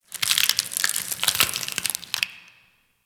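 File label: Ice Crackle Random Ambience; Warframe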